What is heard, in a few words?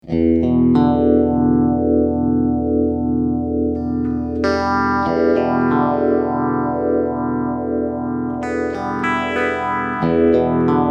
MXR90